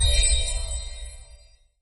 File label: spell